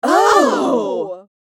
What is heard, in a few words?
Female crowd sexy reaction